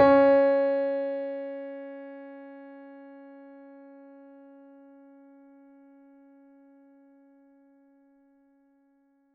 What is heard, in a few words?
mini grand; bananenkeyboard